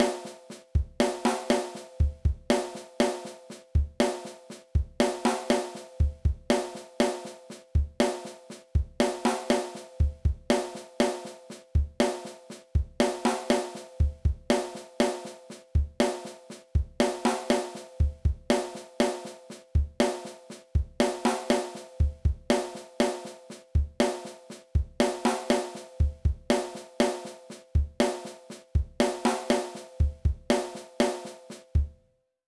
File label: Chop